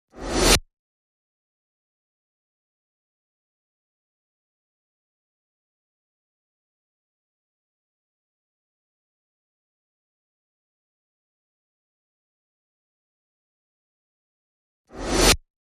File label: FX